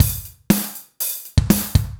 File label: 120 ROCK, drums